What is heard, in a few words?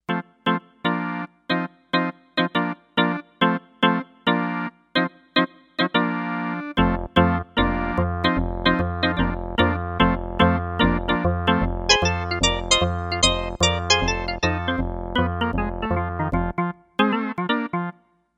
Organ X, Kawai K5000S